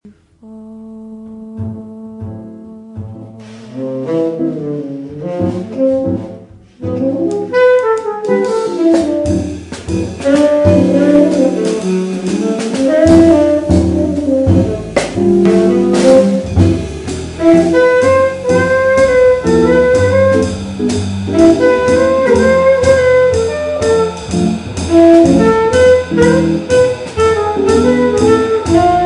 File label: Stringspace Jazz Band